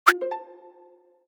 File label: HAPTIC FOLDER CREATE, Sharp AQUOS phone ui; AQUOS sense lite SH-M05